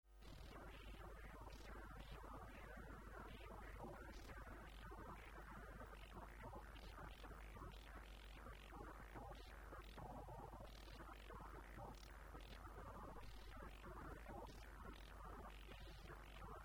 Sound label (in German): Bass mit Resonanz